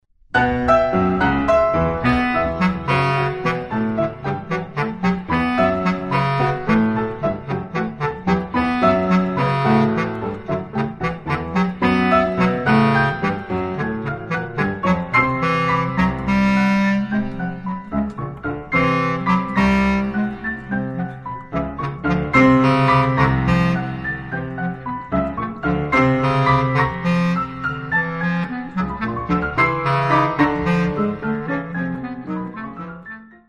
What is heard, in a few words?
Bass Clarinet; B flat; Songs Without Words IV. L allegro